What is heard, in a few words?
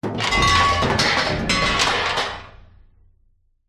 impacts metal various items junk drop clatter lower pitch room reverb; zapsplat